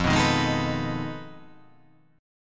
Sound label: F 7th Sharp 9th Flat 5th; F7#9b5 strummed; x,8,9,8,8,10,11,8